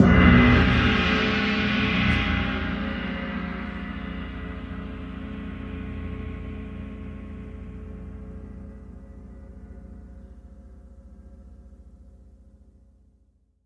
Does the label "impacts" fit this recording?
No